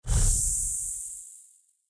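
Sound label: Compressor air out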